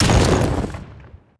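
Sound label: attack black cannon overload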